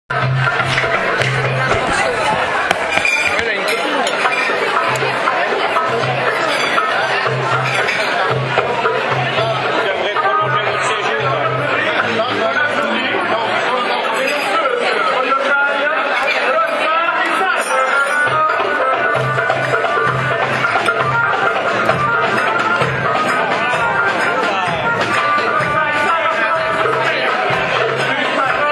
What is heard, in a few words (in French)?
Ambiance TUNISIENNE reveillon, Orchestre